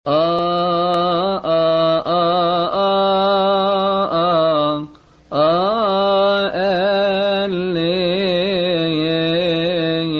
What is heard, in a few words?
Lent Days Psalm Response